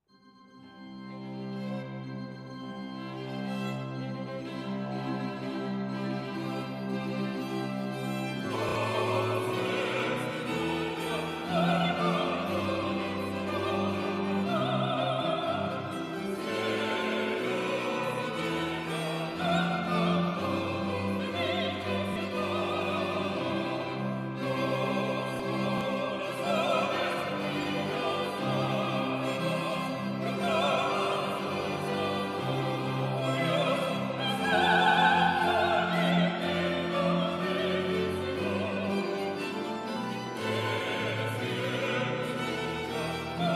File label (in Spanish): Marcha Nupcial 2 Voces 4 Musicos y Director; F. Mendelssohn; Entrada Novia